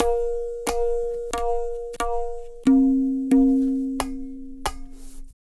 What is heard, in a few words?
tabla, 90 bpm; Zero-G